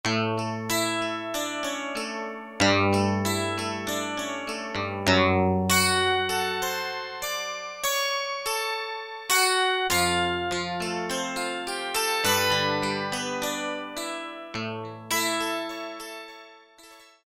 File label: 12 STRING, Casio VZ-10M; Internal factory combi